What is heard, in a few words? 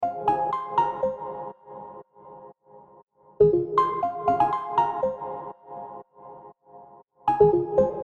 Melody 120 Bpm F